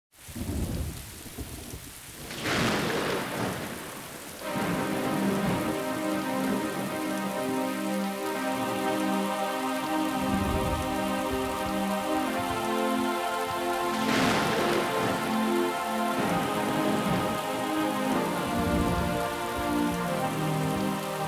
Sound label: Instrumental Version